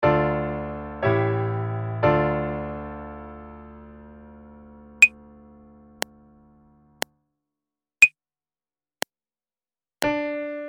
Prepared Melody; advanced musicianship; Practice Sheet 2

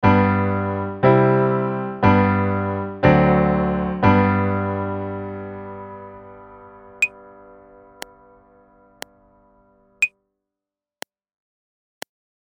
G Major 34 4 Measures wo Piano

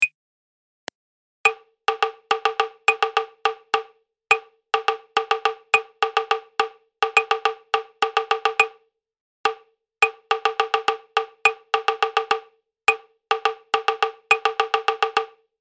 Prepared Rhythm; advanced musicianship; Practice Sheet 4

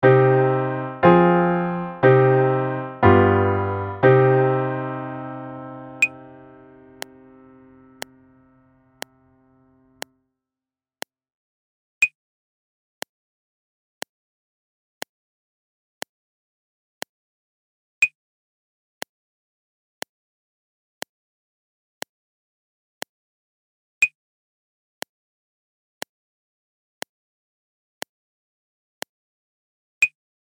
C Minor 68 8 Measures wo Piano

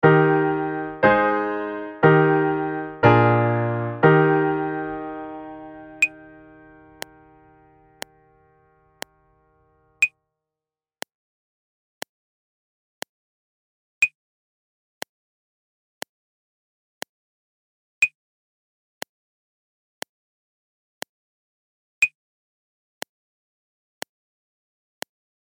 Eb Major 44 4 Measures wo Piano

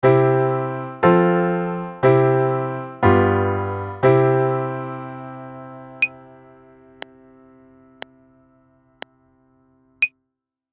C Major 44 4 Measures wo Piano

